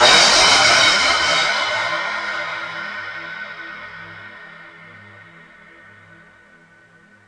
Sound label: cym; drums